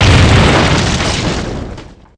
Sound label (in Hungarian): robbanas; Pure Pinball